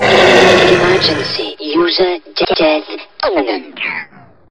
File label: gonome death; gonome hev